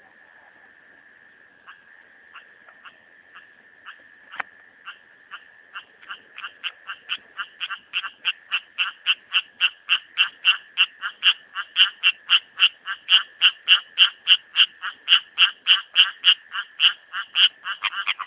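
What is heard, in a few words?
litoria nasuta, frogs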